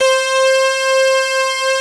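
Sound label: PPG synth brass, PPG Wave 1; Greytsounds VOL-1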